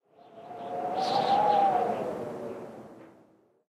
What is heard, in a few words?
Amb Winter Windgust